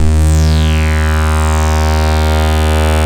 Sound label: REZ SYNC, ARP Synths; E-MU Formula 4000 Series Vol. 3; Analog Odyssey